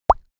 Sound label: Cartoon pop up